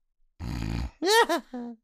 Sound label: snoore; Racetrack Riches; megaboard